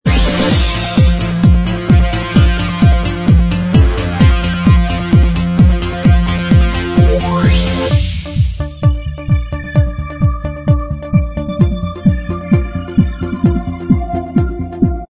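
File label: dance remix instrumental track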